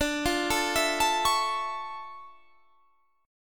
D Minor Major 9th, D, down, Guitar Standard; DmM9; arpeggiated; JGuitar

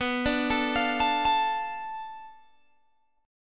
B Minor 7th Flat 5th B, Guitar Open G

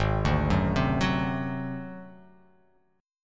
G sharp 7th Flat 5th G sharp down, chord

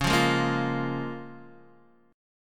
C sharp 6th C sharp down Guitar DADGAD

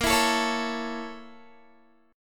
A sharp Minor Major 7th Flat 5th A sharp Guitar; A#mM7b5 strummed

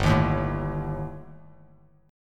G Suspended 2nd Sharp 5th, Bass Standard; strummed; JGuitar

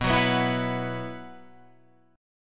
B Suspended 4th, Guitar, Open F; Bsus4; strummed; 11,13,11,11,14,13

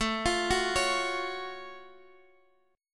F sharp Minor Major 7th A

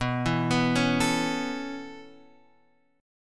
B 7th Suspended 2nd Suspended 4th, chord

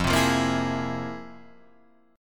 F Minor Major 11th, Guitar, Drop D

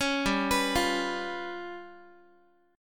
G 7th Flat 5th, Guitar; G7b5 arpeggiated

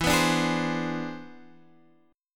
E 7th Flat 13th; E7b13 strummed; JGuitar

